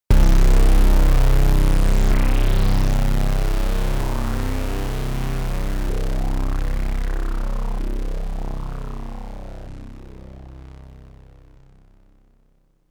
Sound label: OB8 Pad; Synth